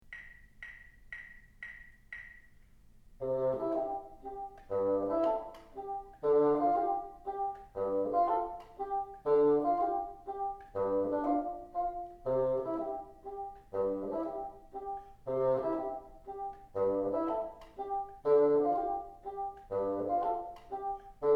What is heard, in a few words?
teacher, bassoon; Teacher Part; Music and the Bassoon